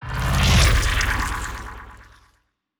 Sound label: ant ship harbinger move; biogenesis